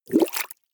bubbles hard

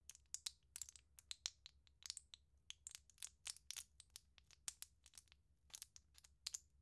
plastic blister handle click

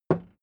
hammer wood

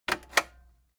cassette eject click slow heavy

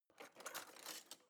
knife wood drawer grab soft

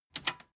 metal button tiny click disable fast

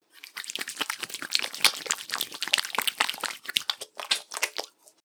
washing hands smacking

